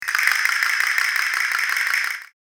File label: Castanets rolls; musical; gfxsounds